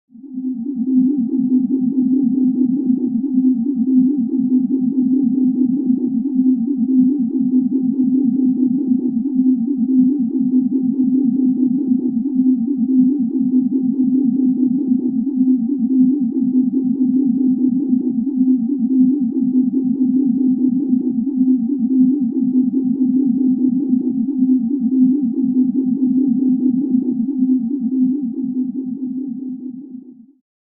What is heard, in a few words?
Energy Beam